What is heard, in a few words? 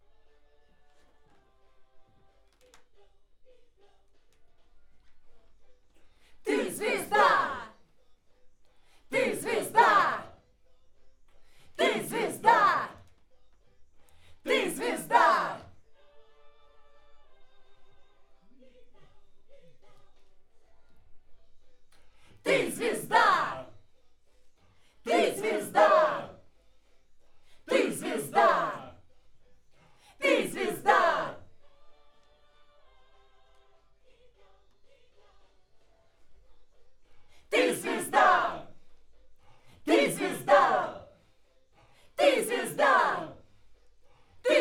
Schoeps AKG, piano